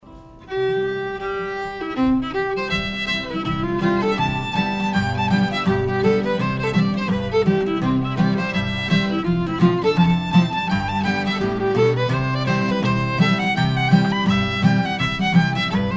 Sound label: Old Schottische in C